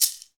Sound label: shakers